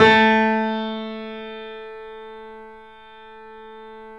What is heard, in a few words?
Yamaha C7, 3D Pianos; E-MU Producer Series Vol. 5